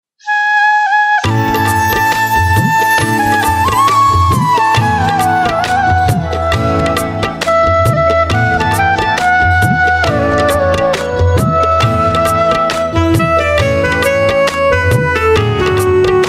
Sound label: Ringtone Instrumental Flute